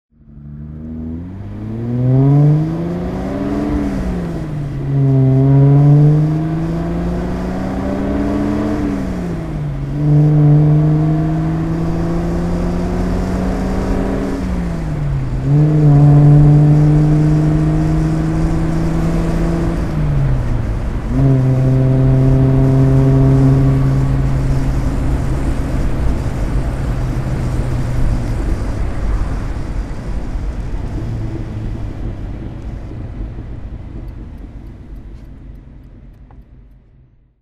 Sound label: alfa romeo 2600 spider driving